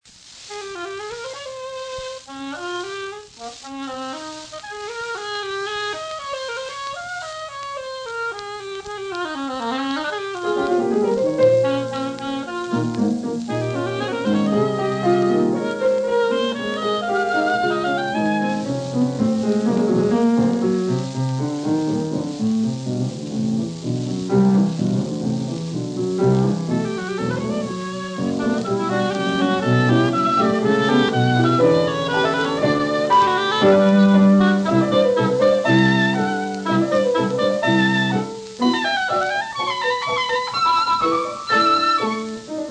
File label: sonatina oboe piano